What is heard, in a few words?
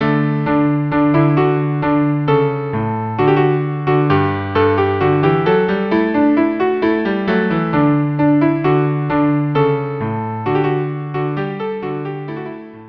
viola cello; Te Deum